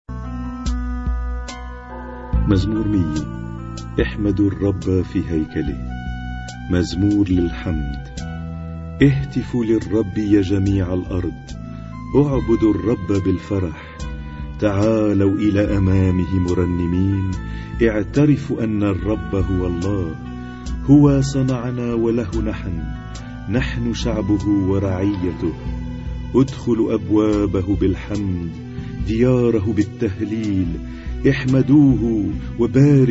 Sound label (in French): Psaume en arabe; Psaume-100; Zaboor